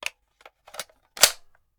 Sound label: Machine Gun Foley; Sound Ideas; Guns